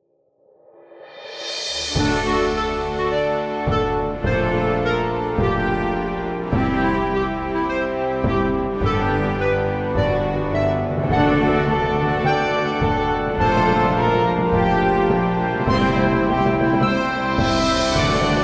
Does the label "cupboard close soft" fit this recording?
No